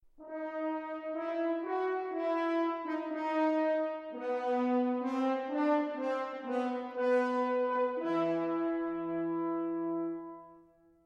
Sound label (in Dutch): hoorn